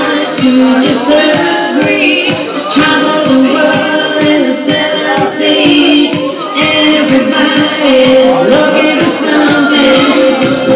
pub singer

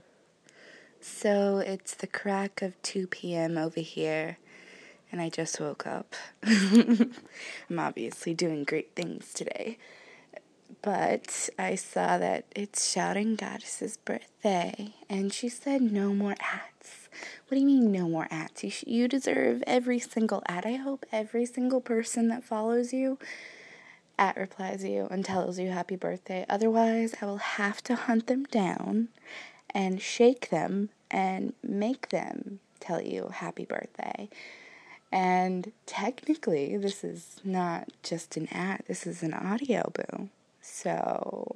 happy birthday darling

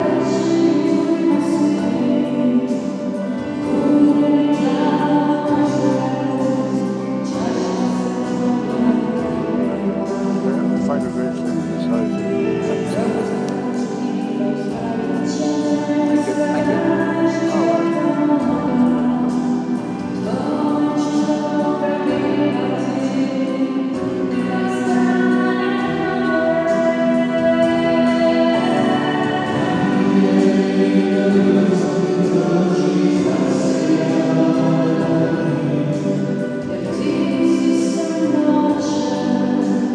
croatian choir

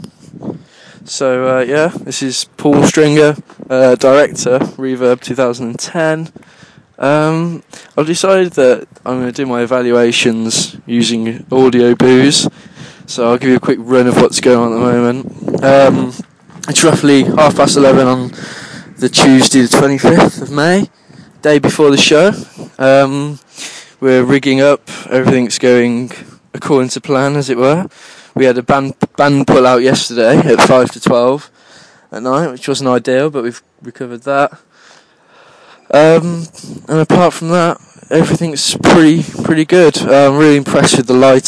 reverb

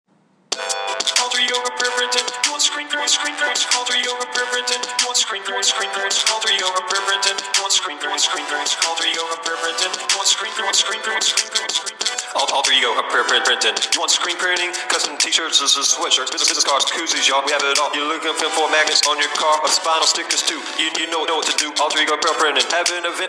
printing rap

